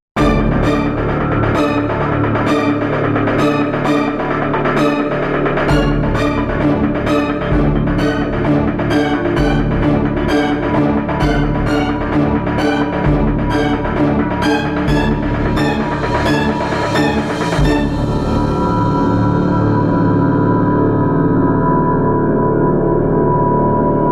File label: Horror Scene Just MIDI